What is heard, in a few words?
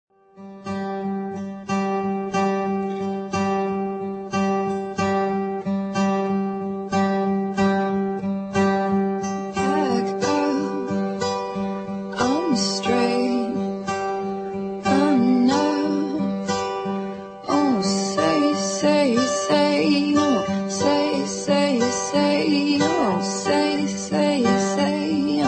acoustic